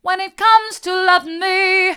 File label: mid, vocal